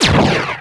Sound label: ROCK